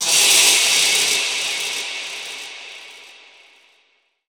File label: TECHNO FX 140